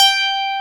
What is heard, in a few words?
12 STR G5, PIANO; AKAI S-Series CD-ROM Sound Library VOL-8